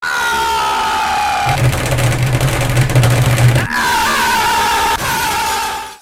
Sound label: aaahhhhhh pound keyboard aaagggghhhhh